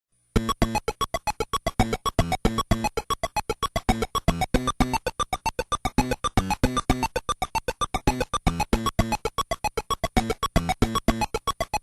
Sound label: ice berg map; kirby s dream land 2 ost